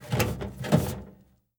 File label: Metal Locker Open; Fairlight Sound Lib